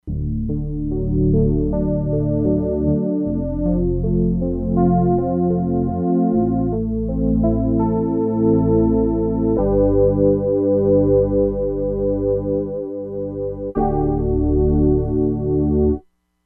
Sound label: SOLEMN ORGAN, Crumar Bit 99; Factory Programs